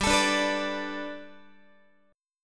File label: G Major Add 9th Guitar, chord; Gadd9; strummed; x,15,12,12,15,13